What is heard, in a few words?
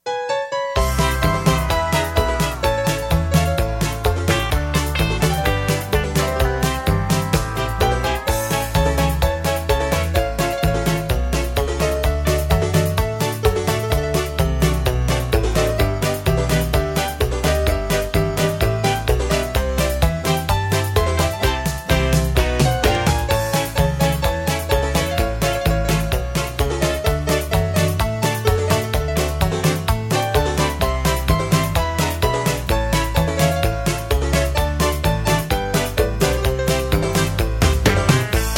music